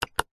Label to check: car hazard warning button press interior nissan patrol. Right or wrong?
Right